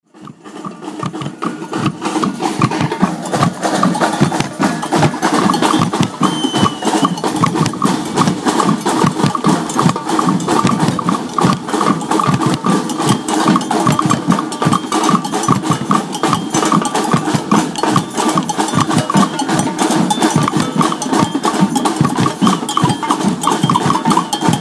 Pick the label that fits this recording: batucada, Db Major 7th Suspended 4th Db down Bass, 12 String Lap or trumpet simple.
batucada